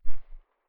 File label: silencer mp9 mono very far, weapons